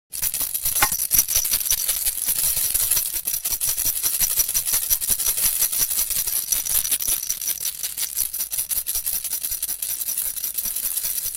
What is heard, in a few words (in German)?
rassel